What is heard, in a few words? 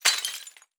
Glass Shatter; Survival Game Sounds - Lite Edition